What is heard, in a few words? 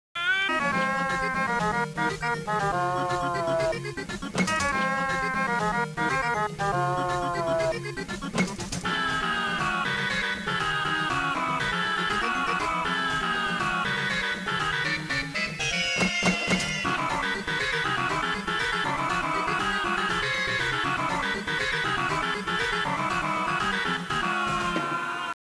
music